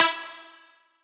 Riddim Melodic Hit - E Crumble; One-Shots; Ghosthack; Riddim Essentials Volume 2

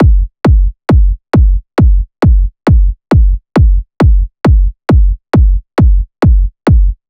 Kick Loop 135, Techno Drums

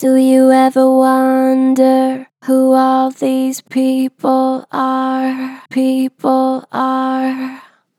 Gen Flat, Pitch Flat Gentle, Vocals; Harmor Vocals

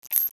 Glitch

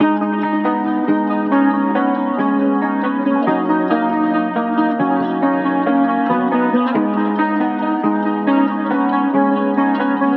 138 BPM G# Min, Guitar Loops; Echoes; Cymatics; Journey Guitar Loops

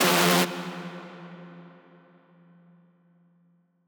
synth screech oneshot F; Synth Hits; GhostHack

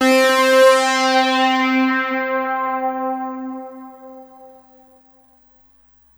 LEAD (C), Pedal One Shots; Monster; Cymatics; ANALOG EVOLUTION Beta

